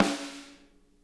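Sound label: snare edge, drum kits; Sonic Reality; Interactive Drum Kits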